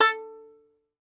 Tenor Banjo, Plucked Strings

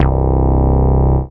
Single Electric Basses, Dance Floor Bass

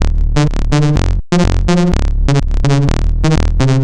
Sicklectro Bass; Sonic Foundry (Sony Creative Software); Underground UK House & Electro

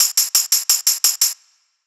PRCLP, Percussion Loops; neuro; Stanza; Drums

